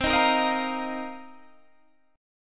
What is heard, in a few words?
C Minor 11th C Guitar Standard; strummed; JGuitar